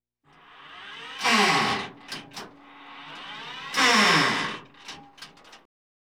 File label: Tank Turret